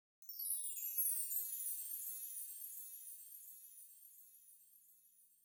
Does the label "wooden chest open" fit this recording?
No